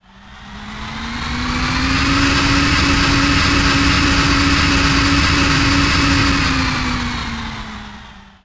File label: Cooling Fans, Trains